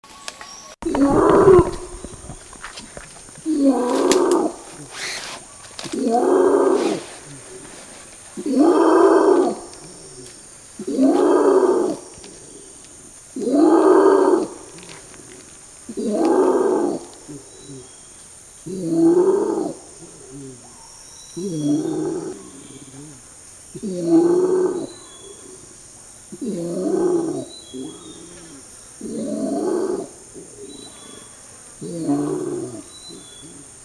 Lork Call

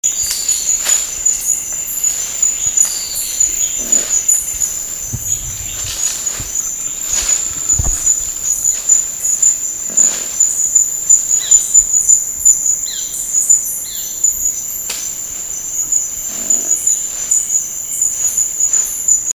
Raspberry

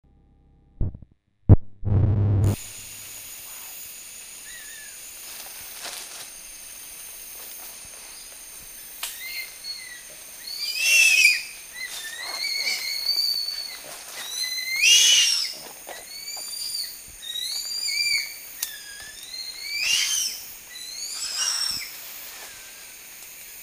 Crying and Screaming

